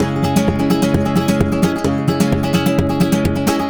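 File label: 130 C# rumba minor, Spanish And Gypsy Traditions; Soundscan vol.59; AKAI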